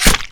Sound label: attack; riki